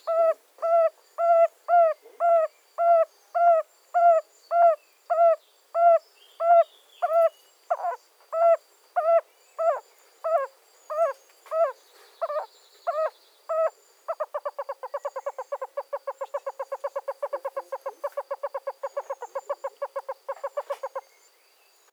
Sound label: yellow bellied toad bombina variegata; lyagushek i zhab